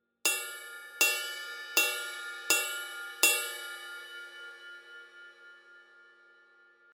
20 I Crash Ride bell hits, cymbal

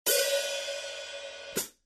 13in Custom Dark HiHats, cymbal

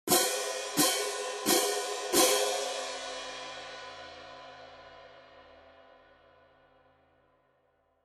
18in Symphonic German Tone Pair, cymbal sound

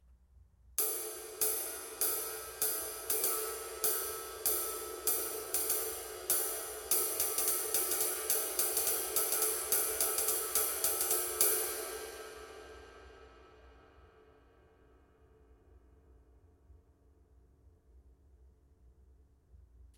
20in Light Flat Ride, cymbal sound

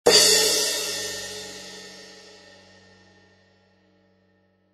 15in Crash, cymbal sound

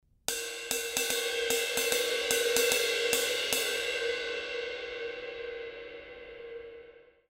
K Con Crash Ride Ride Pattern, cymbal

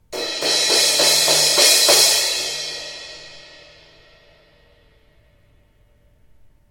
16in Constantinople Crash

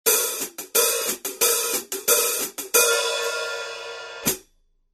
14in Quick Beat HiHats, cymbal sound

